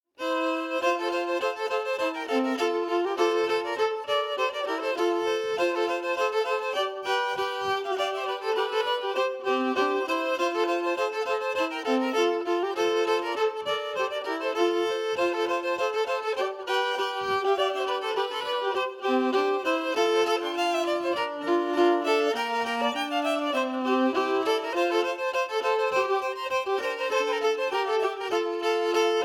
harmony emphasized; Hulls Victory

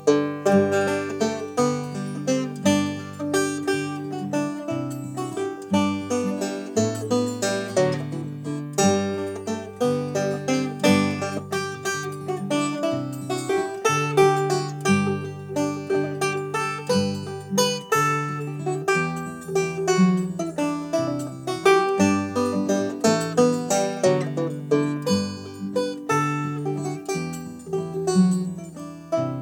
Waltz; Shepherds Wife Waltz

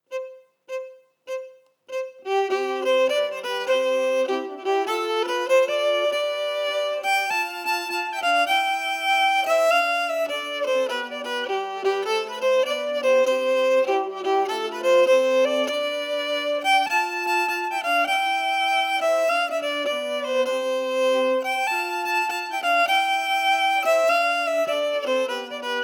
The Cellist melody emphasized

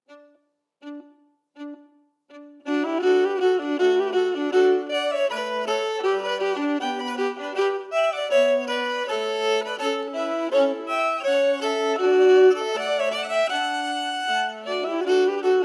harmonies emphasized; Gardebylaten

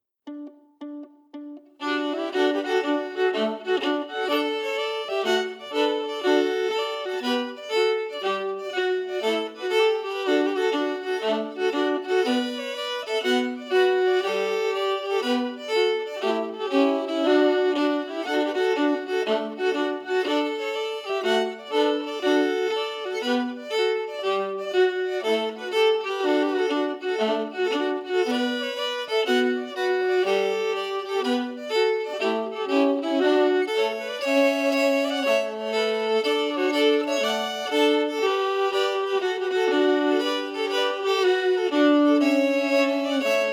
harmonies emphasized; Les Brunets dans la Foret

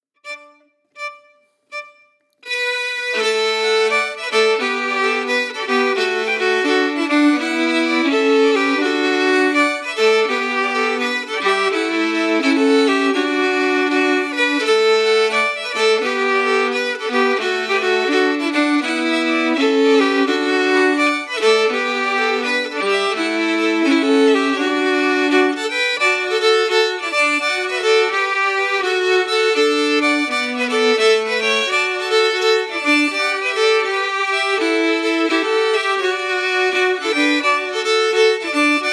bass; Dripping Hat

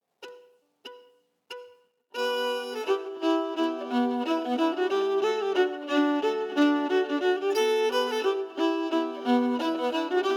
melody emphasized; Hugh McKennas

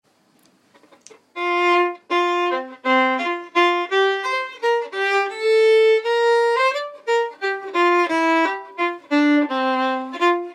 Lydia Ievins slang Polska In F

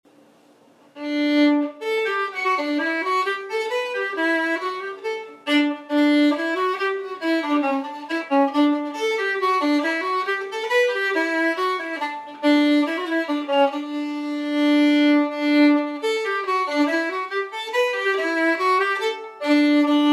Breton Schottische

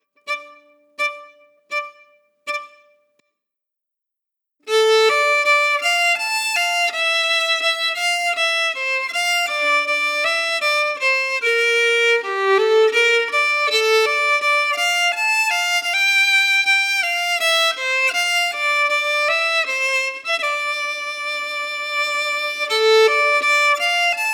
Vals de una Sirena Varada slow

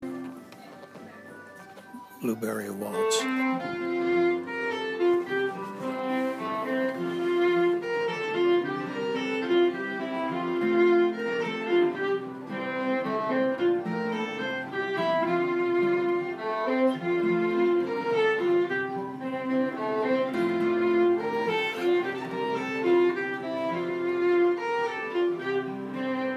Waltz, In F, 2nd fret capo, D position; Blueberry Island Waltz

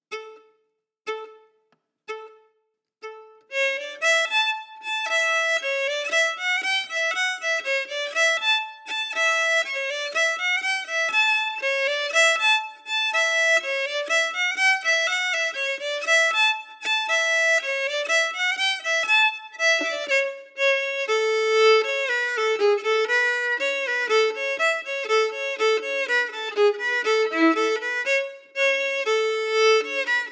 Joe Crapaud slow; crapaud toad

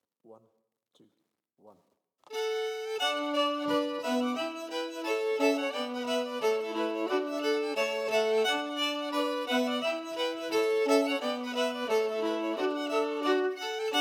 harmonies emphasized

